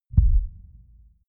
synth low boom; whooshes rises; hits impacts

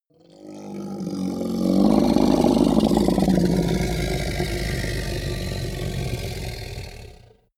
hellhound roar growl aggressive; kreaturen

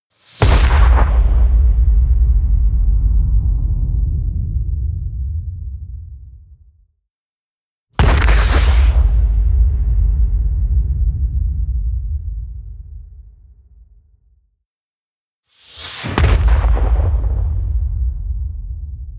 spell fire impact large; explosionen; comedy-cartoon; soundpool

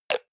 hygiene small cup close hard, badezimmer

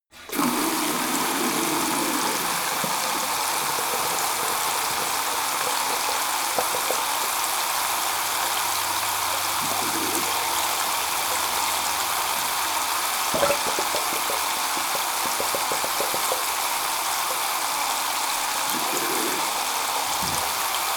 tap flow hard long; haus garten

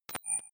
click hover, signale; soundpool

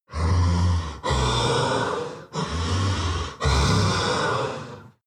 demon dark voice thick breath; Creatures; kreaturen

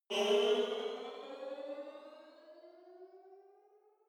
sci-fi vehicle turbo activate distant; raumschiff

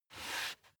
step barefoot wood plank slide normal; schritte; Footsteps

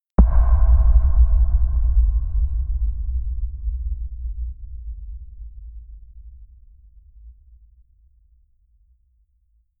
sub impact; hits impacts

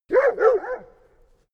labrador bark sequence short; natur-tiere; hunde

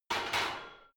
barbell heavy plates replace rack soft; sport freizeit; fitnessstudio

